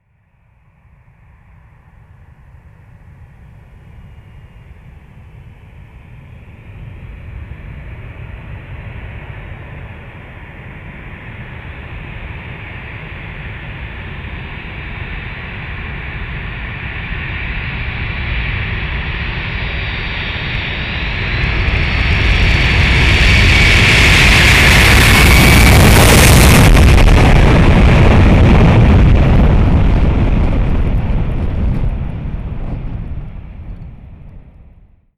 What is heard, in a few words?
Massive Land Flyby